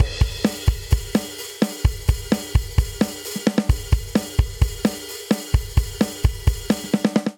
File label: Driving Rock Intro 130 bpm; 4 4; MIDI Clips; Ableton; Factory Packs; Drum Booth